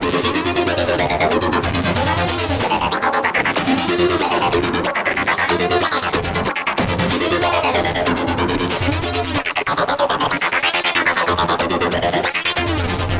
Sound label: Lead Line A 140 BPM, Psytrance; Lead Lines; Pluto